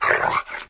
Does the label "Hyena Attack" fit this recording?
Yes